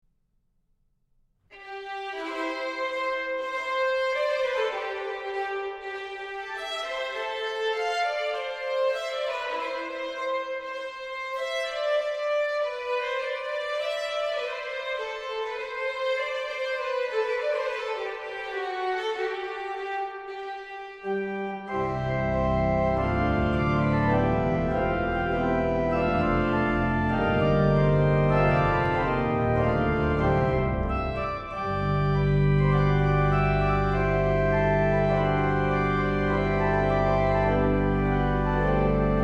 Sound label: Suite For Organ And 4 Folk violi